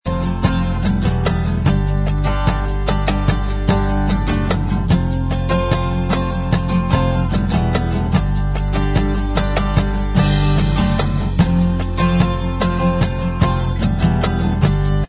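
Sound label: acoustic instrumental track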